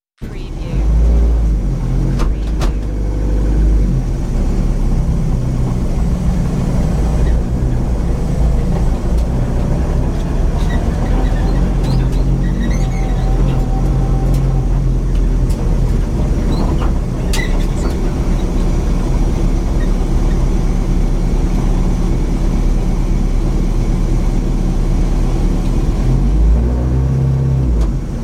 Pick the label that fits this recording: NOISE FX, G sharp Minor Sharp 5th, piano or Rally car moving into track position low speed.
Rally car moving into track position low speed